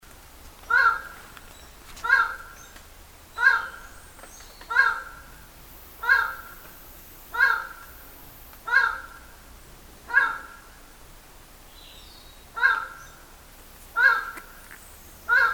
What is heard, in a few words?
Highland-tinamou, پرنده وشم کوهسار